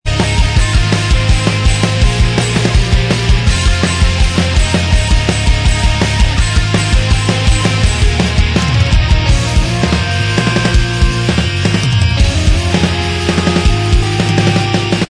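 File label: instrumental, backing track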